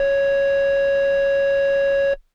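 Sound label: Air Bass Kawaii C#1; keys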